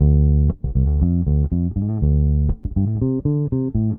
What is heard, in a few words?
Bass, 70s Analog Funk